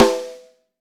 tight acoustic snare sound b key, drum single hits one shots